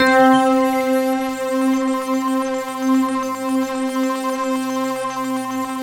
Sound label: POWER SIZZ, Tine Stars